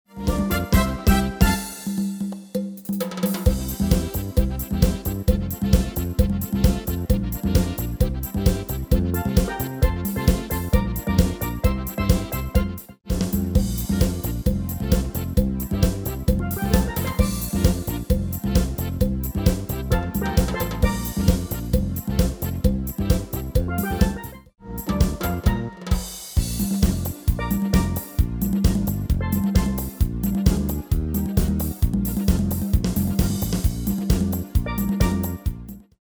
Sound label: MIDI